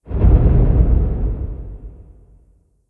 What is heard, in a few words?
Heavy Foot Step; blue archive